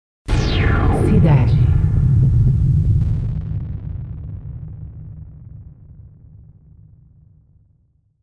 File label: white noise; Cidade FM